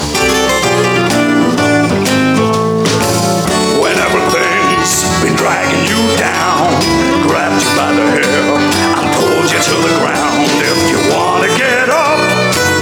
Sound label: revive jingle